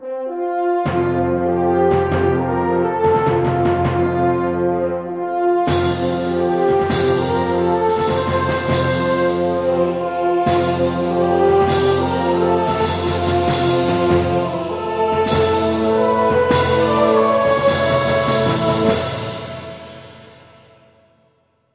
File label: Orchestral Intro